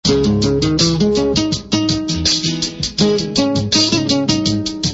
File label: Guitar